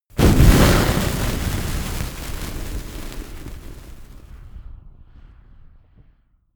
campfire large extinguish

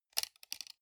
sunglasses plastic side piece fold

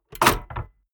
mechanics small movement

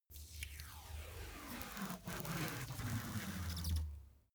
package tape dispense soft

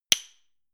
click wood generic

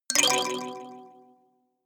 notification kalimba delay slide minor

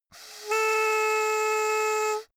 hoot soft

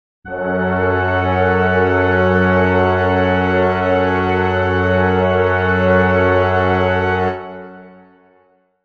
brass chord f sharp minor

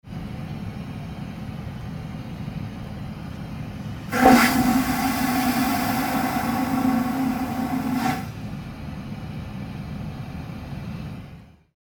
aircraft toilet inside

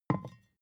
vodka put down

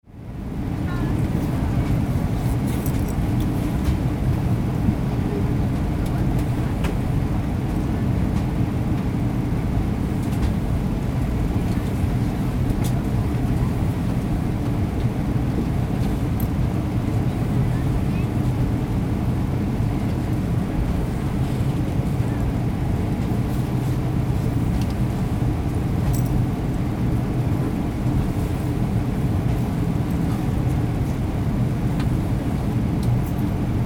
a319 jet cabin inside

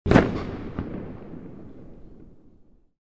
explosion

